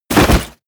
bodydrop armor

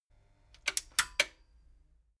metal button stereo click power off slow

